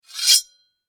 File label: Warfare Knife Scrape Blade Metal; Knives; Combat